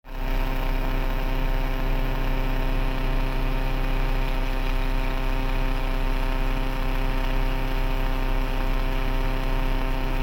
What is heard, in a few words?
fm interference when key turned; blazer